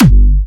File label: Springer G4, Big Room Kicks